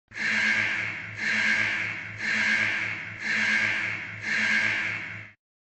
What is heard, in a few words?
warning sound